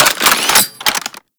Anti Aircraft Gun Equip; Weapons; Warframe; Archwing

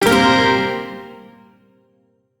Shawzin Blues Chord; Music; Warframe; Duviri; Chords

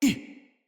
Pain, Battle Barks; Envoy

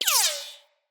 Bullet Impact Ricochet

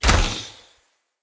Bow Ranged Shot; Weapons; Grand Father Bow

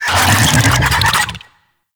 Infested Big Ashen Vocal Attack Death; Warframe

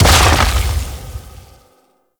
Barrier Impact; Warframe; Warframes; Brawler

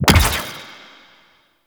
Eye Grenade Launch; Ambience; Gameplay; Warframe; Orokin Moon

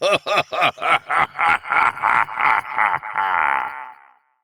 Laughter; Battle Barks; Evil Twin; Doppelganger; Warframe; EntratiDoppelganger

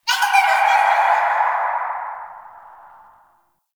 Canvas Laughs; Gameplay; Warframe; Shadowgrapher

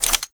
Semi Mode Switch, Weapons; BE Tetra

